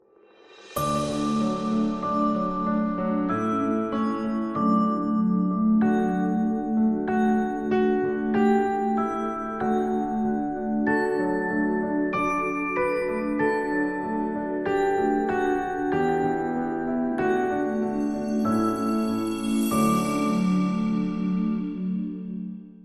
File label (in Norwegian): Deilig Er Jorden (Norwegian Christmas Song Based On Schesien Melody), Verse 3